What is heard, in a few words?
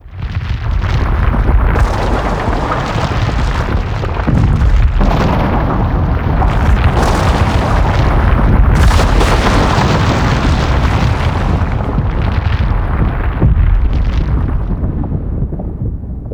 Moon Huge Rock Collapse; Ambience; Gameplay; Warframe; Orokin Moon